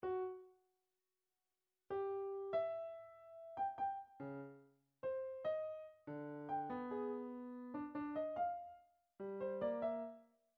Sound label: ten short piano pieces, midi; shostakovich